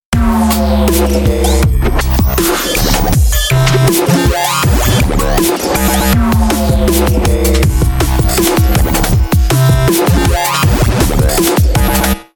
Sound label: LAZER BASS all